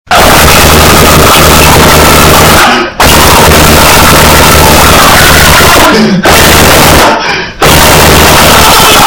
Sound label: ear piercing